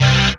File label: dance chord